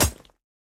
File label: Weapon Spear; Going Under